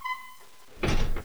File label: Castle Door